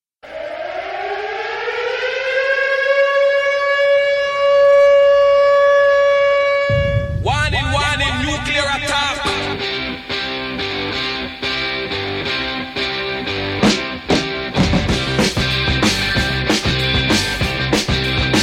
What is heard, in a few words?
Noises from the Kitchen